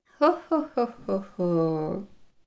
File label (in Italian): Risata Fem Babbo Natale; la risonanza